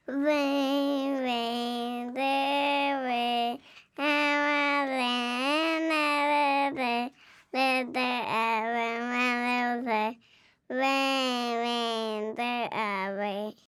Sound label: Nursery Rhymes Rain Rain Go Away; DukeBox Radio